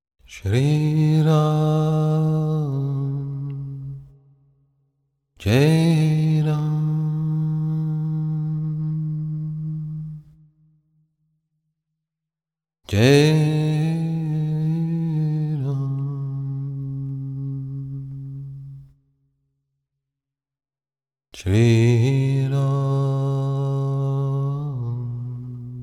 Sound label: sri ram bhajan